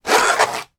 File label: toy sword plastic remove from sheath; sound effects one